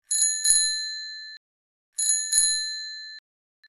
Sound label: sms ring; ringtones